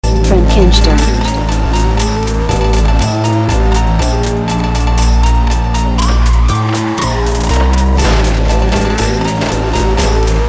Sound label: Phonk